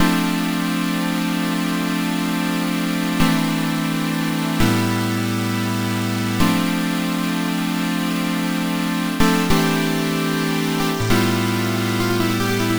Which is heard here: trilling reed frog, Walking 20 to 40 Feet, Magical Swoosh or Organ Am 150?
Organ Am 150